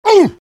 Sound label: pain sharp; heavy; Garry's Mod; tf2 content